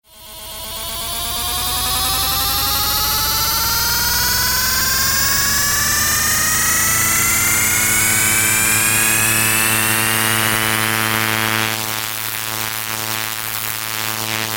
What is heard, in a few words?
new super sound